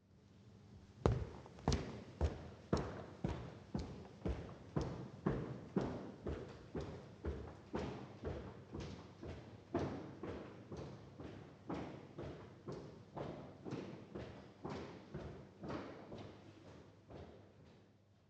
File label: steps in the corridor